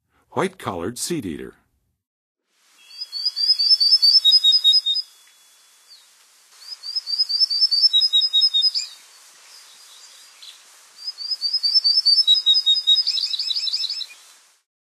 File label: White-collared Seedeater, Songs of Birds of North America; Western USA; Western USA Songbirds